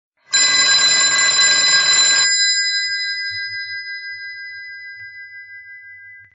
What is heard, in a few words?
old phone